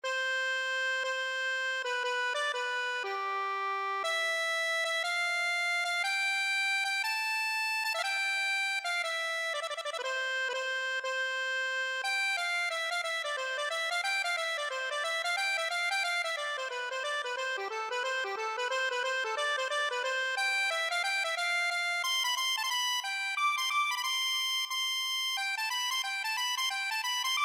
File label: accordion